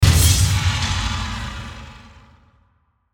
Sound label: Hit Metal Scrape Reverb; Metallic